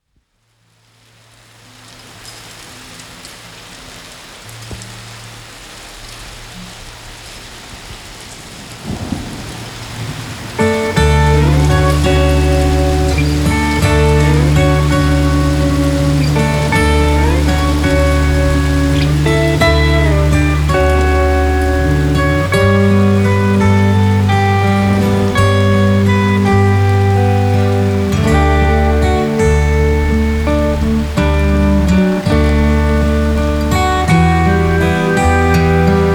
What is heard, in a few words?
Pouring Rain; Wasteland; Scafander